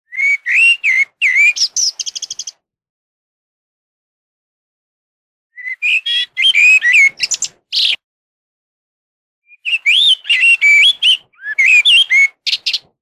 merlo, birdsound

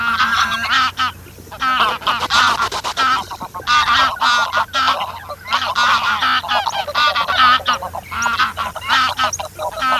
OCA SELVATICA

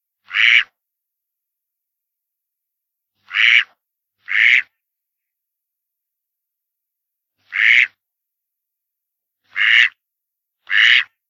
GERMANO MASCHIO

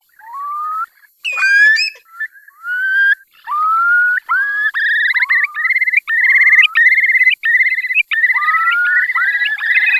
CHIURLI

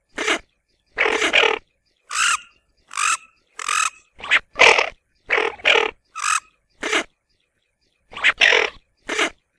MORIGLIONE MORETTA

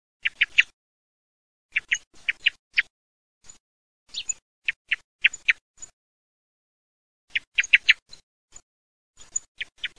TORDO ZIP

